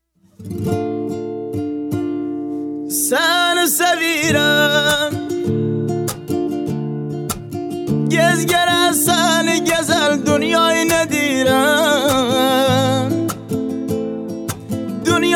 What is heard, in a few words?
Guitari